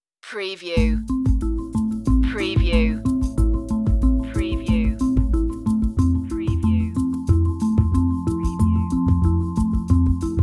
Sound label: waterfall